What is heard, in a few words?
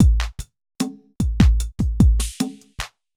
evil beats